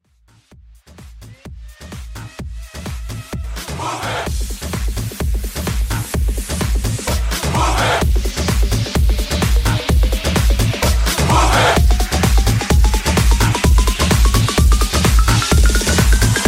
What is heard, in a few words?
Party Moombah 128Bpm